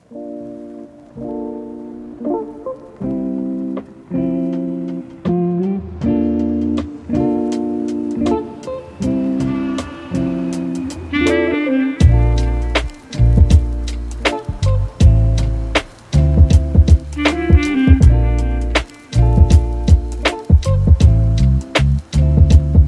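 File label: Reeds, Chill Beats, lofi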